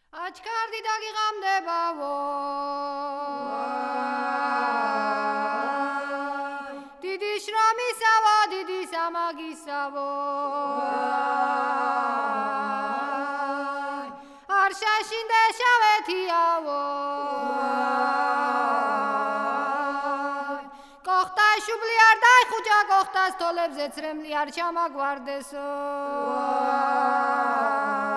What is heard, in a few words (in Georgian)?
xmit trili moxevuri; Mzetamze